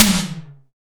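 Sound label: SDS V SNR, snare; Best Service; Real Mega Drums VOL-1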